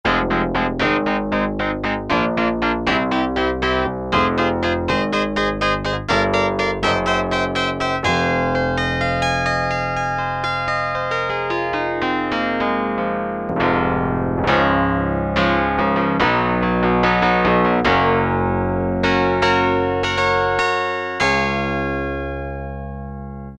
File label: PIANO, Yamaha DX7